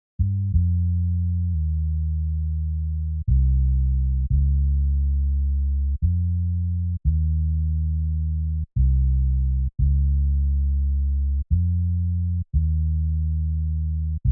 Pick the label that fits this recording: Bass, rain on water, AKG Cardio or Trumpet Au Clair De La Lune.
Bass